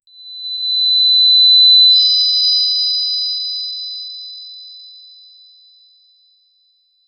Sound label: generate a 2 3 second mag, activation